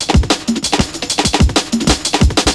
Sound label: kick beats